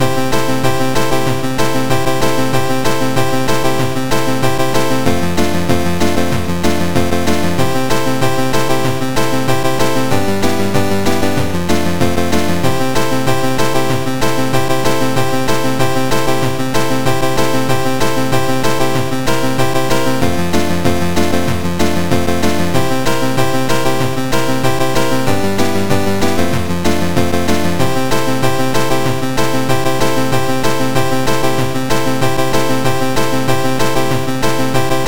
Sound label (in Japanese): 8bit ファミコン ロックンロール